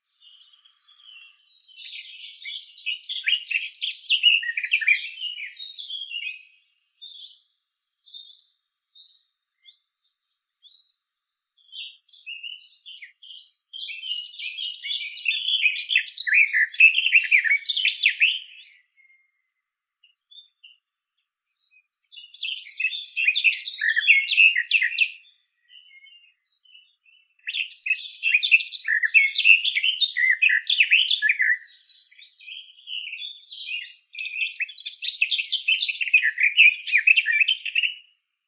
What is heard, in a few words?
slavka sadovaya sylvia borin